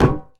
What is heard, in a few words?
Impact Metal; Puddle; puddle plus ios gamerip 2018